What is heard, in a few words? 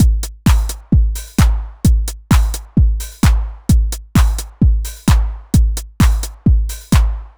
130 C trance kit; Trance Explosion Vol1